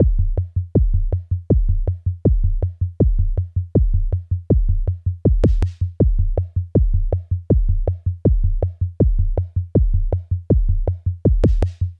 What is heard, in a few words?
RHYTHMIC LOOPS; Umlaut Audio; kontakt